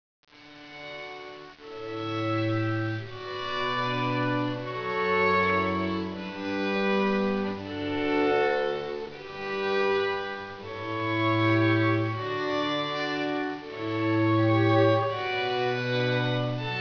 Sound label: canon